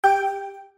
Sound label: Bip; Akinator; Android